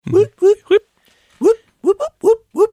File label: wooting and whooping